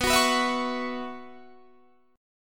B Suspended 2nd Suspended 4th, Guitar, Standard; Bsus2sus4 strummed; JGuitar

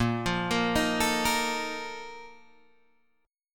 A sharp Major 7th Suspended 4th, Guitar Open G

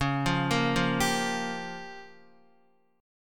C sharp 6th Flat 5th C sharp down Guitar; arpeggiated; C#6b5; JGuitar

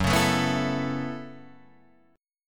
F sharp Minor 11th, Guitar, Drop D

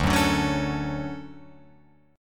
D Major 7th, Guitar, Open F; DM7 strummed

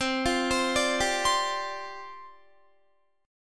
C Suspended 2nd Suspended 4th, down, Guitar; Csus2sus4 arpeggiated

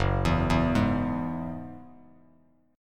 Gb 7th Suspended 2nd Gb Bass Standard; arpeggiated; JGuitar

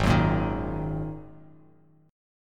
Ab 7th Suspended 2nd Ab, Bass Standard; Ab7sus2 strummed; 4,9,8,8

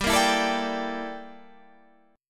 G Minor 6th Add 9th G down Guitar Standard; Gm6add9; strummed; JGuitar

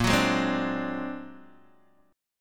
A sharp Augmented 9th A sharp Guitar DADGAD

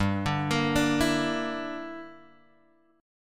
G Minor 6th, Guitar, Open F; arpeggiated; 7,9,10,9,7,x; JGuitar

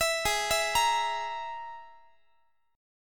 Ab Suspended 2nd Sharp 5th Ab down Guitar; arpeggiated; JGuitar

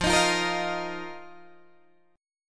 F 7th Suspended 4th F, Guitar, DADGAD

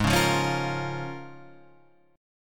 A sharp Minor 7th, G sharp

